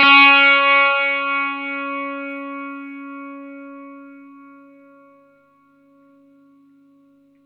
NOTE CS, Vintage Blues Guitar; AKAI; USB Soundscan vol.22

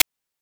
PITCHED NOISE; NES DPCM; MIDINES

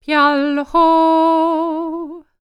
NEAR EAST, Vocal World Voices; ILIO; Vocal Planet VOL-5

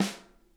snare, drum kits

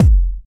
Bassdrum; Drums; House; Best Service; House Definition VOL-1

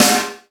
SD, Yamaha RY-10, Drums

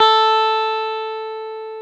GTR, Dual Guitar; Club-50 - Foundations Roland

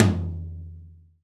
TOM, Roland, Real Kit

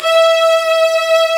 VIOLINS B3; AKAI S-Series CD-ROM Sound Library VOL-2

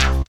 JAM SYNTH, KEYS

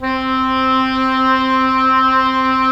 Cor Oboe; Roland - LA Composer vol. 4

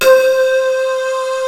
CLEAN FLUTE; Rubber Chicken Software Sample Bank

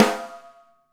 BIG RIM, SNARES; Back In Time Records; Big Bang

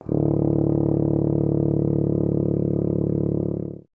BRS TUBA, Solo Instruments

